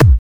Bd Garage, Kick Drums; Zero-G; Soundclash

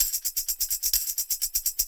Percussion Loops, Shaker & Tamb; Houseworx

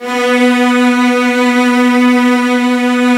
strings B2

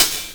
OPEN HH; USB Soundscan vol.51; AKAI

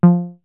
DISTORTION BASS

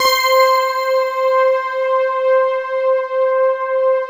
STAC, synbell

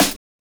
Snare, Drum and Bass

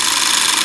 RATTLE, JAPANESE DRUM; AKAI S6000 CD-ROM - Volume 1; PERCUSSION

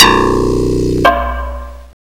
LOGOS BASS; OLD SYNTHS; Rubber Chicken Software Sample Bank

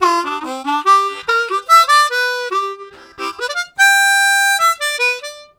Ragtime, Harmonica; Zero G

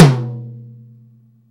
TOM, Drum Dry; East Collexion; Drum 1

